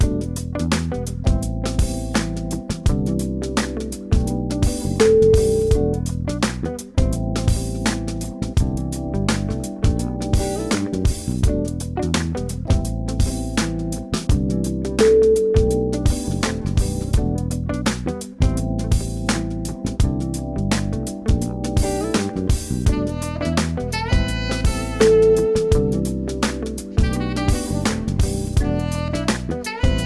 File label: sexy vibes